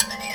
Finger Hit, Prepared Kit; Battery 3 Sample Kits

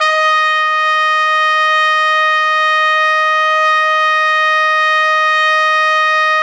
D#4, Pop Brass; Best Service ProSamples vol.19; AKAI

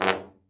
BRA TROMB, Trombone; Sound & Vision - Gigapack II CD 1

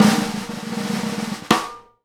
snare roll hit; E-MU Producer Series Vol. 8 – Platinum Phatt; Live Snares

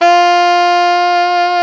SAX ALTO FF, Alto Tube; Roland LCDP07 Super Sax

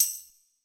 TAMBOURINE; Yamaha SY85

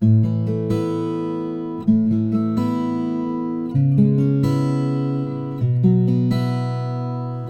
Acoustic Latin Guitar 128BPM C#min; Vengeance - Essential Clubsounds Vol.4; Guitarloops

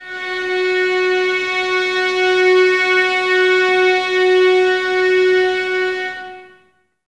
VS F#4, Violins; Miroslav Vitous; 11 Violins

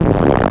Perc Hit, Noise

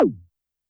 Tom Synth, YAMAHA RM1X

